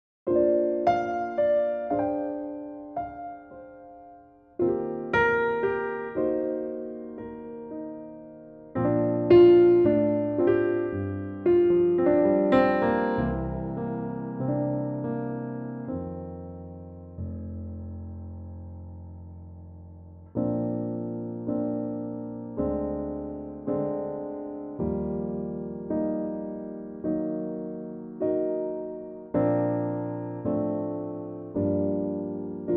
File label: Bb piano